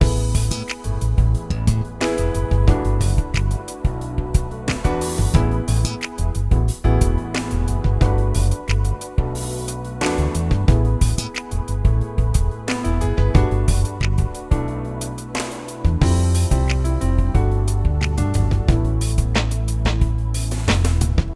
main music; RS Games Sounds; Left Center Right

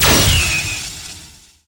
health orb shot; Original TK Sounds

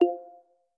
Audio Focus Exit Mode; iOS Sounds

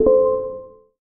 page finish loading; ChromeVox Classic Sounds

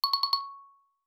Siri Stop Failure Haptic; iOS 27

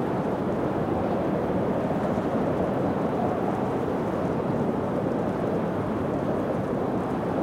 eerie; Golden Crayon Sounds

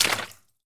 water step; Golden Crayon Sounds

